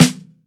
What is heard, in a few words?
fluffy snare single hit b key